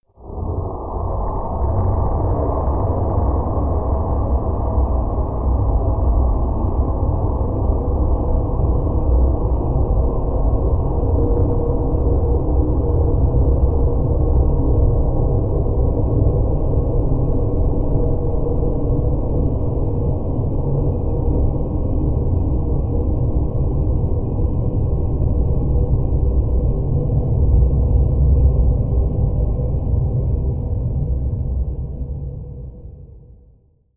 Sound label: deep pad breathing, drones